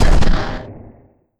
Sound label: Missile Explosion; Cruelty Squad missing unused soundtracks